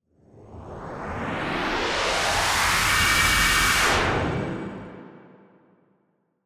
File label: Dark Capture Swirl; portal quest android; Tutorial